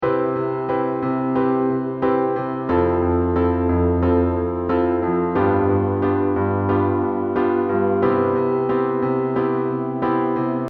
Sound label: 3 chord 7